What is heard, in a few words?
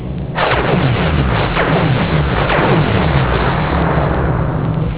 firing phasers; Enterprise; startrek